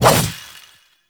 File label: Two Handed Nikana Swing; Warframe; Duviri; Dax; Weapons